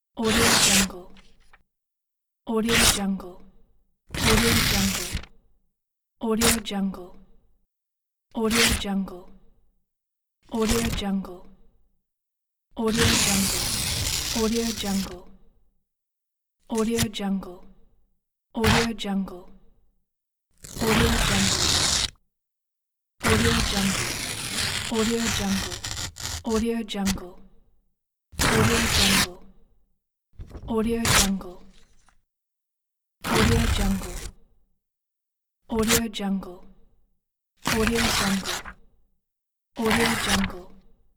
tearing paper

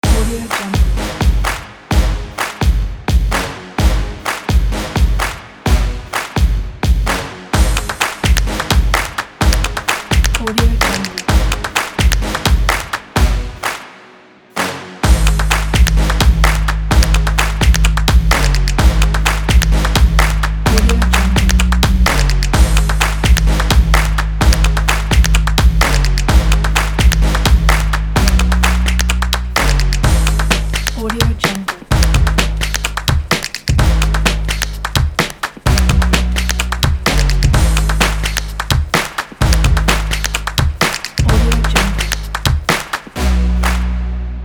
upbeat drum

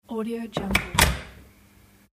door open

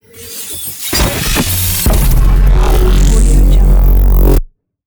attack defense lazer blast charge; robotic lifeforms 2

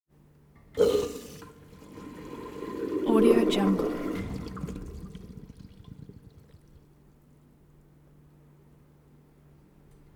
toilet flush raspy choke water gurgle

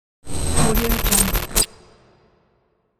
reveal glitch logo

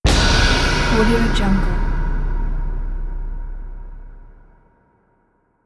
industrial explosion; marburymedia

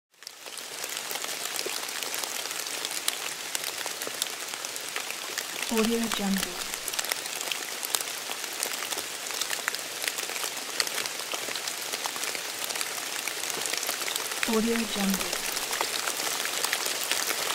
rain

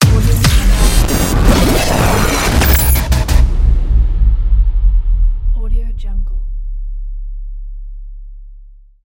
glitch logo

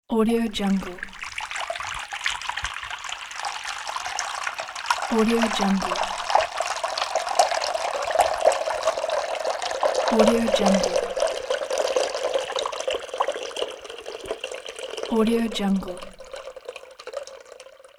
water running in a sink

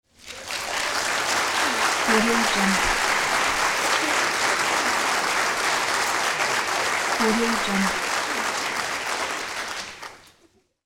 audience applause; fascinatedsound

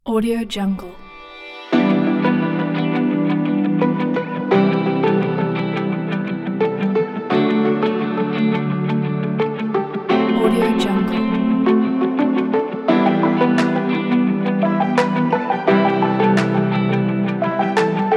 upbeat corporate; grainemax

